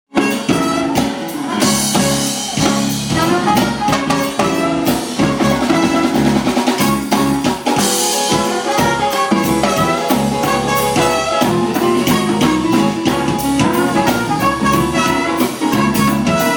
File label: band